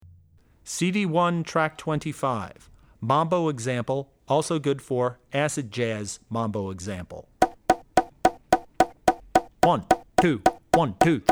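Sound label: Mambo, Drum Tracks; Drummer's Bible Tracks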